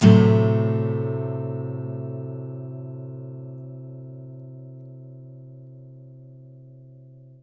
BARI CHORDS, Blues Guitar; ILIO; Fretworks

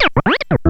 CLAV SEL; USB Soundscan vol.32; Roots Disco; AKAI

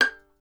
STR VLN, Solo Instruments; Miroslav Vitous

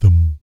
BASS THUM; Spectrasonics; Vocal Planet; Jazz FX

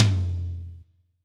tom, drums; Yamaha SY85

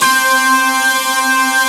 JV HEAVY DUT, JV-1080; Syntec; Wall of Sounds

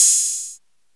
CRACKED HA, Techno Trance; E-MU Formula 4000 Series Vol. 2

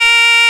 REED A#; Big Fish Audio; Synclav FM

